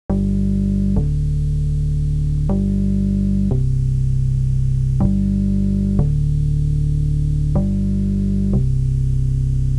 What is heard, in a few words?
bass